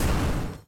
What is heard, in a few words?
shoot; Ben 10 - Hero Time Game; heatblast